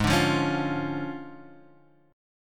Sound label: D Minor Major 7th Flat 5th, Ab